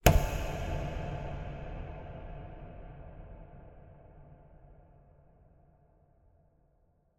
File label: sound design hit suspense horror reverb cinematic